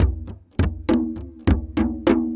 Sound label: Java Gourd Slow Harmo; Sonic Foundry (Sony Creative Software); Ambient Atmospheres & Rhythms